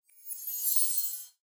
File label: Card Pack